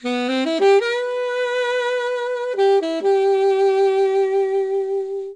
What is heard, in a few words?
ALTO SAXO